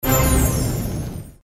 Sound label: hit; Flaming 7s